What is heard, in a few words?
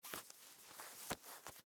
Track Pants Untie, Foley, Clothes, Track, Pants, Untie, Draw, String; Dressing; BlastWave